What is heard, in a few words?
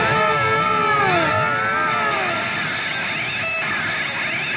Clinical Slide Delay Guitar; Sonic Foundry (Sony Creative Software); Indie Rock Anthems; Session 02 - Clinical